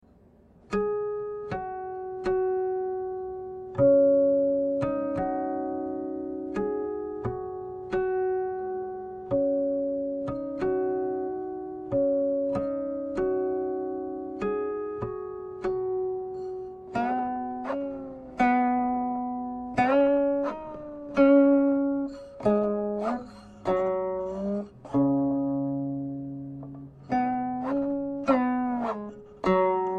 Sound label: guqin yin silk